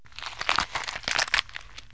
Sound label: Body Being Crushed, Cartoon Crashes & Crushes; Wild and Wacky Sound Software; 1,000 Sounds